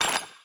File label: ui module switch